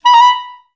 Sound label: B4, Advanced Orchestra; Best Service